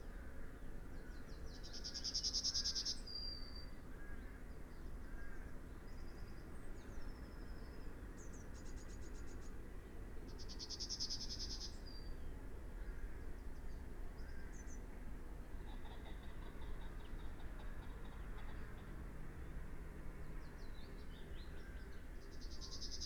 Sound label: Yellowhammer Emberiza citrinella